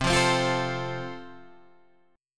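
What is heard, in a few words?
A Minor Sharp 5th, chord strum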